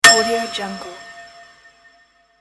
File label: anvil light; boomopera-sfx